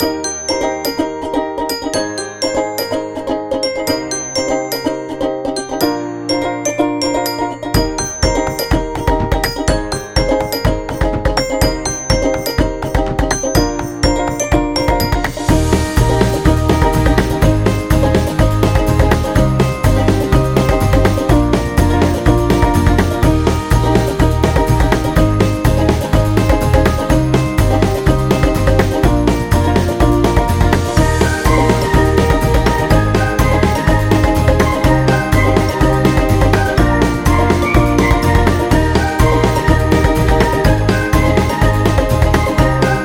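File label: Birthday Happy, Kids background music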